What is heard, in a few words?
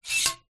industrial caulk gun metal slide back